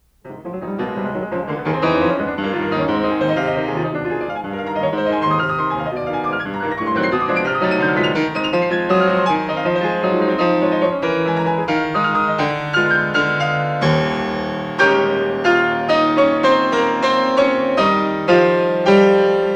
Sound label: Carnaval for piano; Schumann; Op9